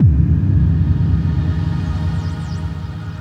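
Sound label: bass drum FX, dance drums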